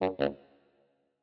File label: ui error